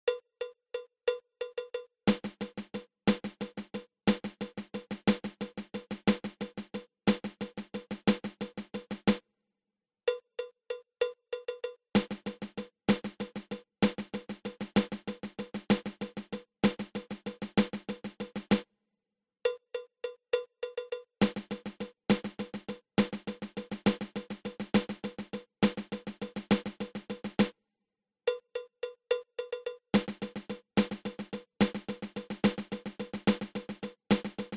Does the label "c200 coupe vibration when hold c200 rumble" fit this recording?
No